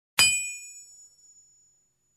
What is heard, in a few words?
Single Hammer hit on Metal in forge